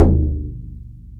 Bodhran Lo; Multisamples; Digital Sound Factory Planet Earth

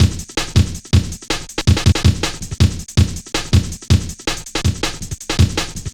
boom, Drum Loops; Jungle; Boom 161.5; Zero-G Jungle Warfare Complete

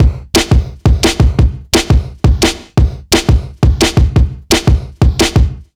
173 break, Jungle; USB Soundscan vol.05; Explosive Jungle; AKAI; 90s sample CD

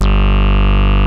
HITEC HI G1, ANALOG BASS; Music Store - Claudius Diemeter Schwerlos

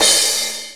Acoustic Crash, Drums; PX7; Digital Sound Factory Protean Drums

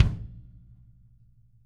KICK

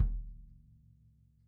kick

